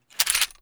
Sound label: deagle mag out; pistols; weapons; swb